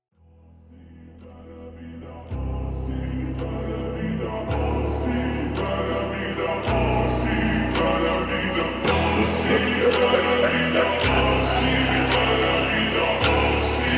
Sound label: bass remix